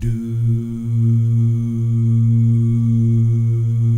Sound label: "DOO" GUYS, Vocal Jazz Fx